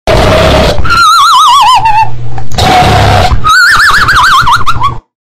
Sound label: dog sleeping